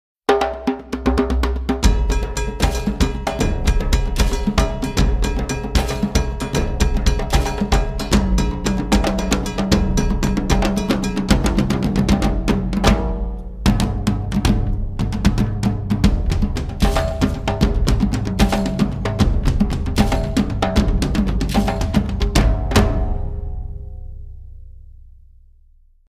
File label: Accompaniment; Shes Gonna Eat Me